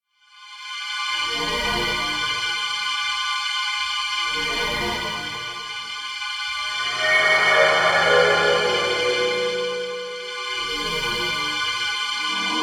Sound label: Scary